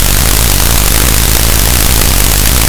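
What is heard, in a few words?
chainsaw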